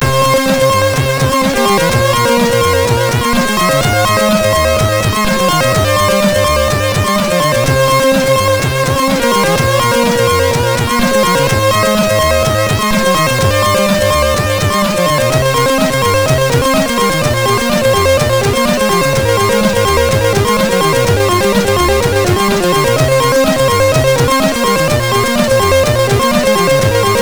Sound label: Intro Music, MOS6581R3; Intro Music 2